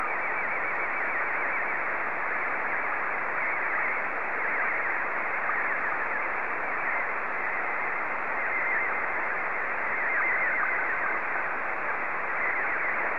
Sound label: sstv